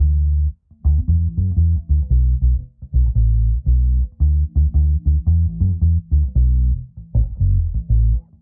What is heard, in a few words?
bass